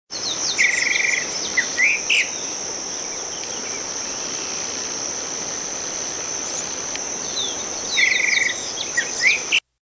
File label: Mimus gilvus; Ilha Coman